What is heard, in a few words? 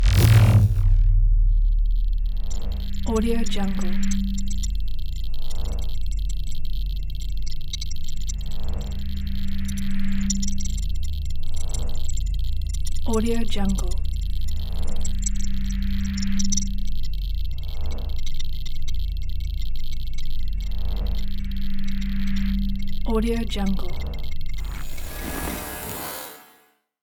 utilities sensor